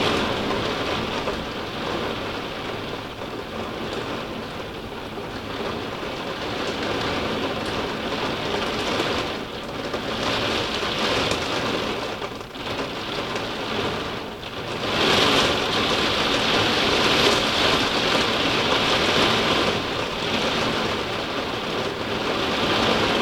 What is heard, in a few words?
rain on attic window; Moderate to heavy rain drippig on an attic window.